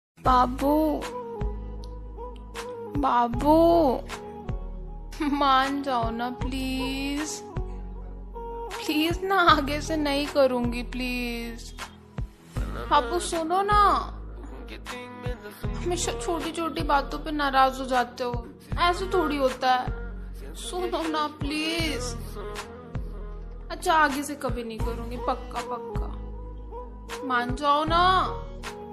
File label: sad Hindi ringtone; tiktok